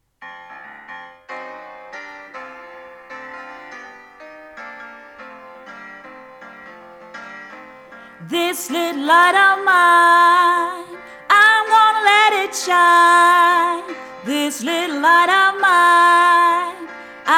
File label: This little light of mine - melodi